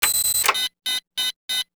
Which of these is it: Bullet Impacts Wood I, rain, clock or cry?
clock